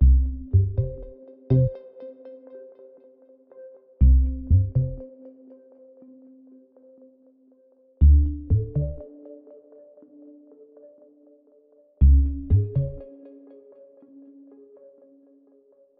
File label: Intro Screen Loop; lego super mario android ios 2020